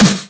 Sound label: Verb Snare